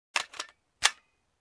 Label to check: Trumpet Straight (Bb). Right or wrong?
Wrong